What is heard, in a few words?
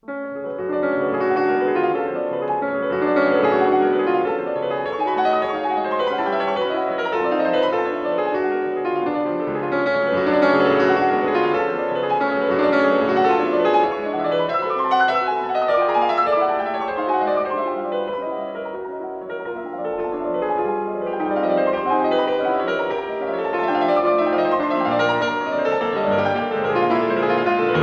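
Prelude in F sharp minor, piano; Chopin; Op. 28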